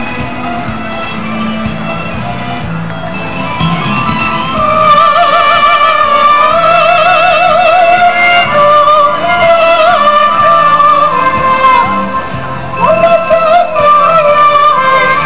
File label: rally; china